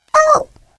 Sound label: turret collide